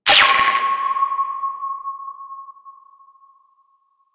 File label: Order Ping; Warframe; Veilbreaker; Devourer Kahl